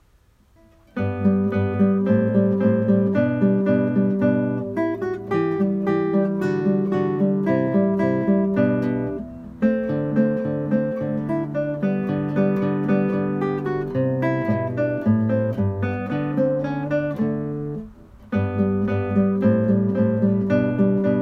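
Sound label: Waltz Carulli, guitar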